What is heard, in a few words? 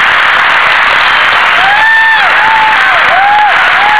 Mars Landing Applause; Mars Exploration Rover (Spirit) landing realtime; Jubilation at the Convention Center; Everyone at the Convention Center